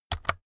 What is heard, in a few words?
keyboard external key press fast